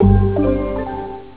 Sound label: logon; xfce